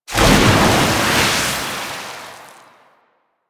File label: Tidal Wave Cast; Warframe; Warframes; Pirate